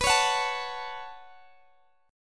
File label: B 7th Suspended 2nd, Guitar, Open D